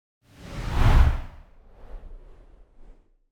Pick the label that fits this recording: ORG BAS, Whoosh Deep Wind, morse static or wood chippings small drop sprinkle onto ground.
Whoosh Deep Wind